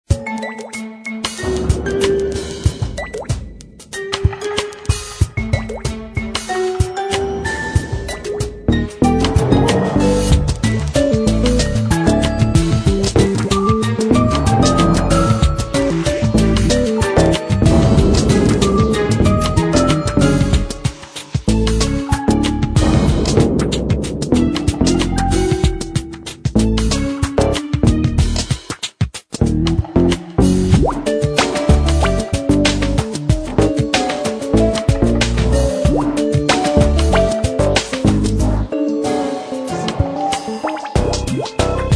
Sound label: Level Music; Soap